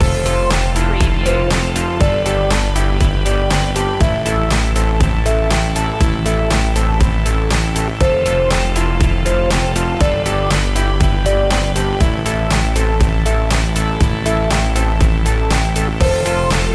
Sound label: best happy uplifting loop